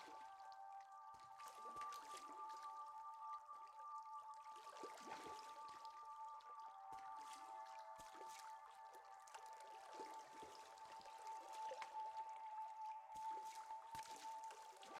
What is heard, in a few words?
Jingles